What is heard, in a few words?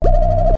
engine whoople hit; Weird and Interesting Hits; single hits